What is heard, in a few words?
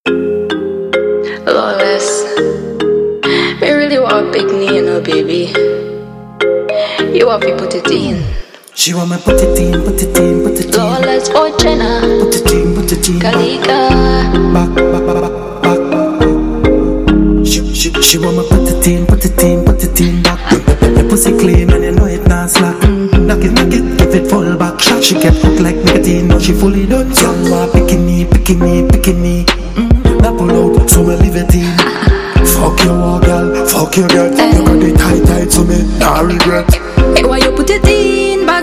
vibraphone riddim